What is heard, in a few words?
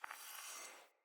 Staff Caster Charge Heavy Start; Weapons; Player; Staves; Starter